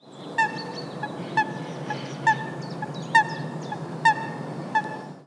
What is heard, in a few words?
Fulica atra